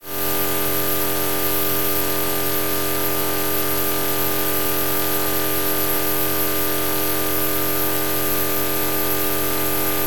Tone, Buzz, Noise, Steady, Buzz, Coarse, Harsh with Noise, Thin, High